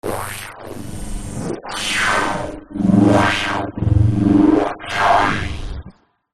science fiction electricity power surge then blow out; zapsplat